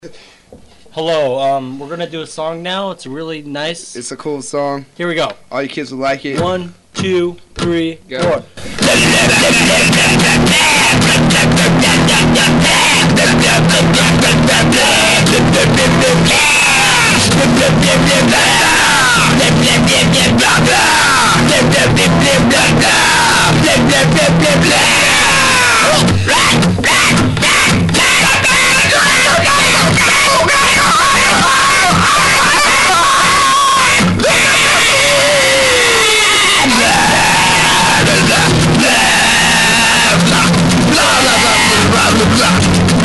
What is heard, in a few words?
blah hardcore